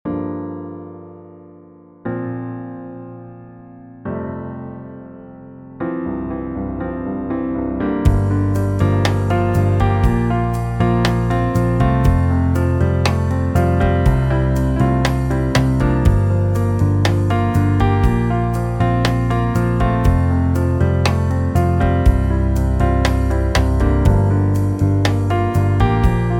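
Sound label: Moving 120 F Major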